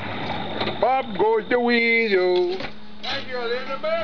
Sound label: swamp salsa